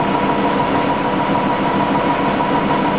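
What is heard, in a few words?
ambient machine; dm cavernous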